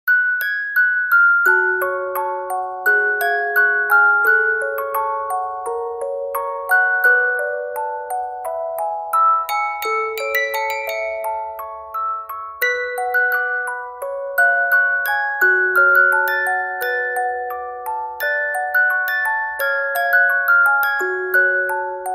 musicbox

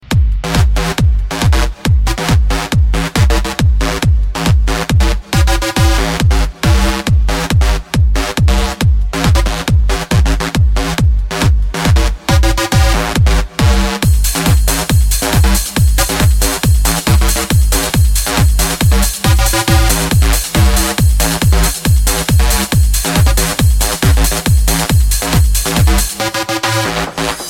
Tek Drum

